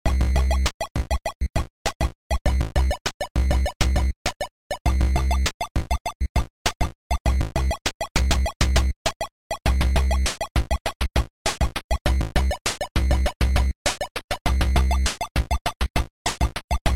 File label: ufo; Pixel Devil and the Broken Cartridge